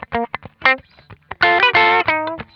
Funk Guitar; Zero G - Funk Guitar

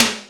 JD800 Dance Card Drums

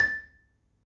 marimba A4; Terkelsen

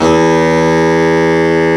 BARITON SAX

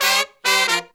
Phantom Horns, 132BPM; 90 s Sample CDs; Zero-G

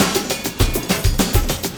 loop, Drum & Bass, 100 BPM; Ueberschall; Drum & Bass Resonance

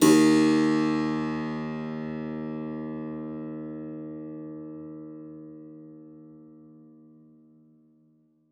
SPIN D2, SPINET; Xsample VOL-7

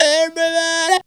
Funky Vocals; USB Soundscan vol.18; AKAI

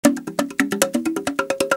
LOG LOOP, Perc Loops, Techno Trance

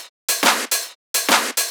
Shuffled Loops, 140 BPM

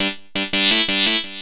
SYN LP, 170 BPM; Ueberschall; Drum & Bass Flash Trackz

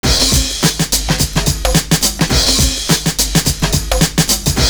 pulse me; Univers Sons

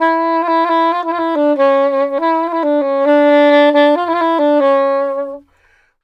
SAX, Balkanish Traditions

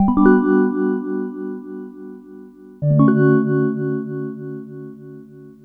Gong Loop

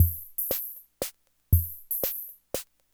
Loop, classic machines, rhythm77

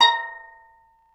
Steel Drums A#4; NorthStar - Global Instruments VOL-1

